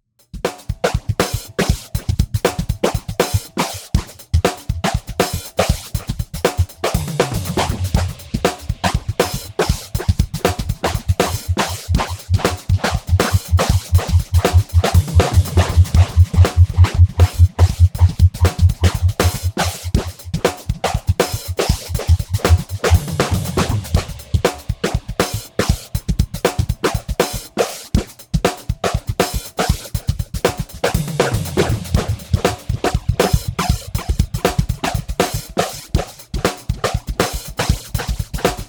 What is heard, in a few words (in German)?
EFFEXX RT1701 Drums Effekt Routing; Radikal Technologies